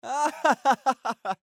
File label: Laugh